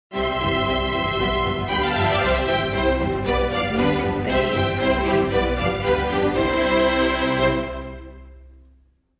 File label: Symphonic Classical Style Ident